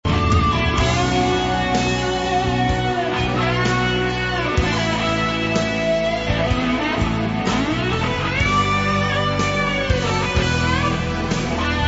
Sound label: guitar